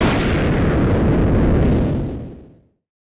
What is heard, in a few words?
spell disappeared; items